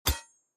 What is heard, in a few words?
sword Stone; out of reach treasure royale 2020 gamerip pc